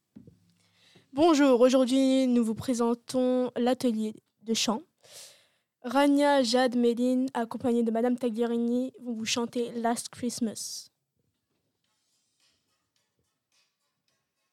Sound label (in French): atelier chant